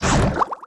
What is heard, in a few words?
Player Pain Poison; disney star smash android ios mobile gamerip 2020